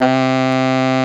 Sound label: sax; Greytsounds